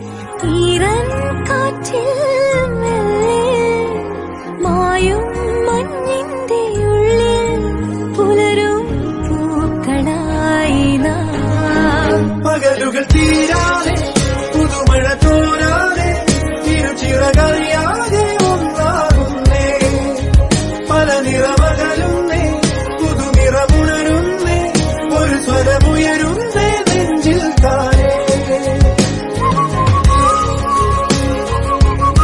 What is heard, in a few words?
Malayalam Ringtone